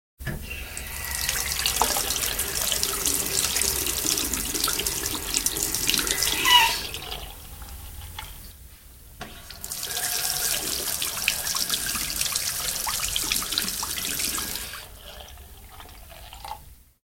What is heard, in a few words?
Tap; 100 sound effects